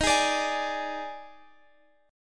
D sharp Major 7th Suspended 4th D sharp Guitar Half Step Down, chord